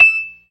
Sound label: PERC, ORGAN; Keith Emerson Signature Series